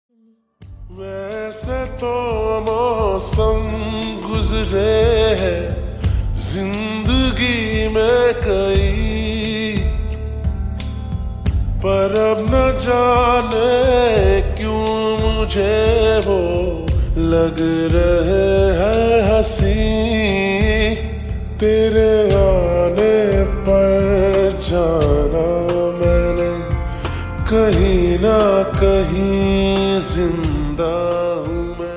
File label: Slowed Reverb Ringtone